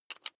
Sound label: electric drill bit fasten wrench apply